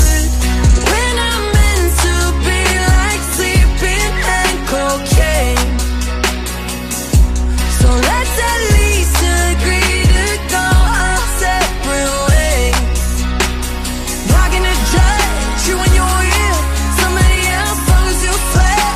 english ringtone

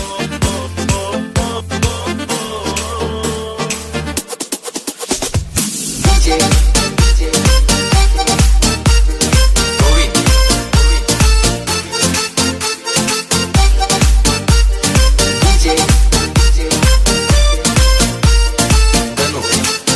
Malayalam with big bass ringtone

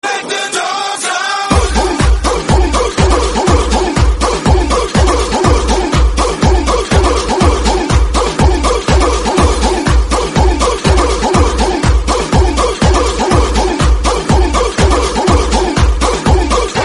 dog remix dj beats ringtone; english ringtones

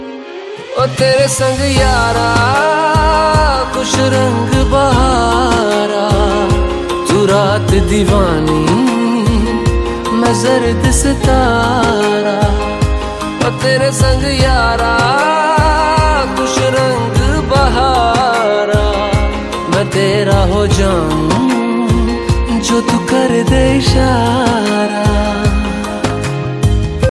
ringtone, bollywood ringtones

